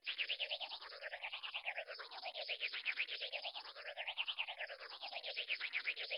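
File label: super spitter fly loop; Hollow Knight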